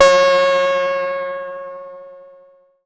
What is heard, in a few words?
C4, SYNTHS; OBMX